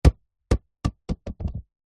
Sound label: sport soccer ball bounce to stop concrete